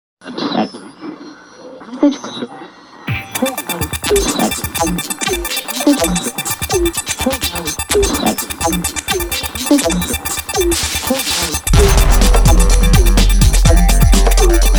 Cool Newz Radio; Soundzabound

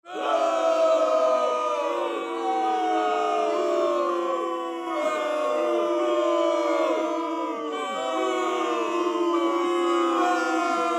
crowd boo

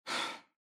Exhale

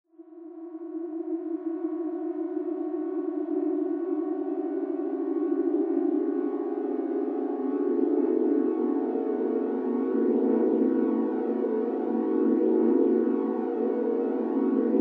Eerie Swells

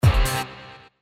Beat Blast

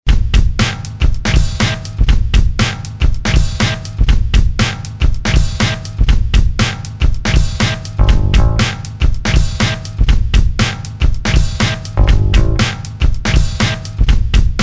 Grooveloop; Soundzabound

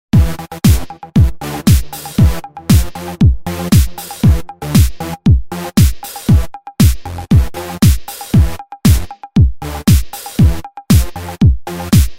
Dance Back; Soundzabound

